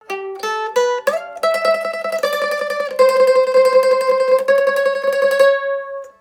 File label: bulg advanced ending, mandolin